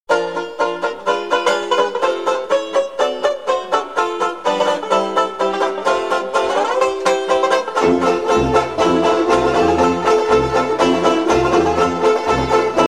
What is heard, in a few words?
Bourbon Street, banjo